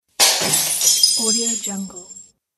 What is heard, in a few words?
bottle breaking